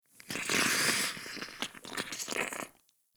Ext Goop Thick Burst; Ambience; Misc Outdoor; Warframe; Infested Eris

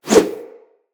Melee Idle Whoosh; Weapons

